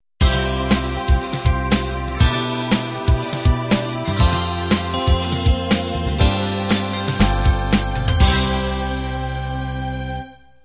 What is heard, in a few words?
6 string beat end